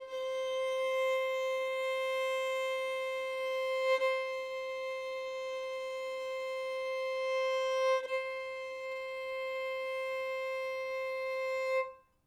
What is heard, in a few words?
violin